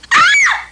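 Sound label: scream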